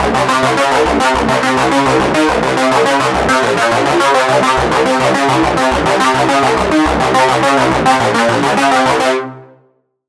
lead; City Killer; Routed Sound; Vital Expansion - Doom Carpenter Vol.1

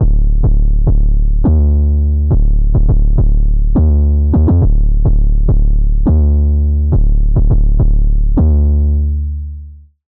808, Spire; Bronx Tale; Routed Sound; Spire Expansion; Street Vanquisher Vol.1

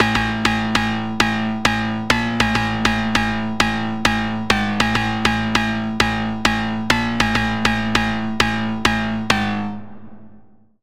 Pluck; Routed Sound; Spire Expansion - Chaos Bringer Vol.1

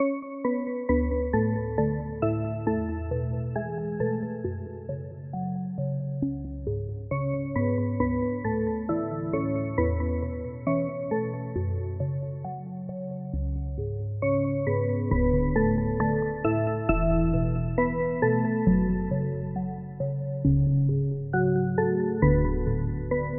Key; Routed Sound; Spire Expansion - Story Walker Vol.1

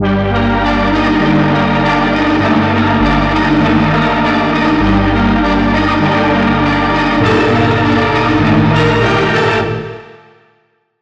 Key; Routed Sound; Spire Expansion - Street Vanquisher Vol.1

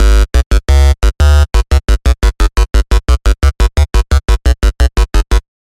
Bass; RGB; Routed Sound; Serum 2 Expansion - Hyper Gamer Vol.1

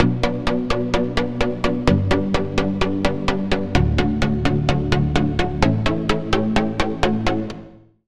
Chord; Routed Sound; Serum 2 Expansion - Star Scavenger Vol.1

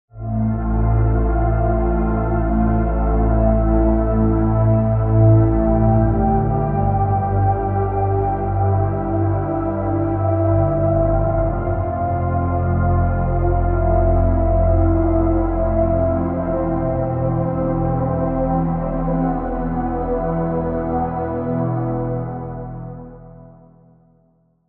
Pad; Routed Sound; Spire Expansion - Chaos Bringer Vol.1

